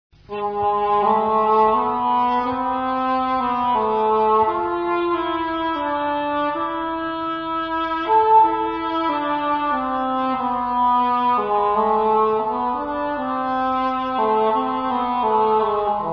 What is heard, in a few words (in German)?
englhorn